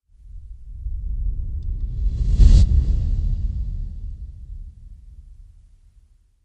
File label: Cinematic Fast Whoosh Blow